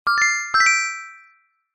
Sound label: multimedia game sound gem treasure found